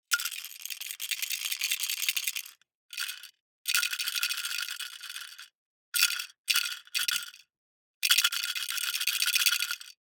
DICE in Plastic Cup Closed, Shake, Mini, Eight Dice; Samples; ArticulatedSounds; DICE Sfx Party (Extended)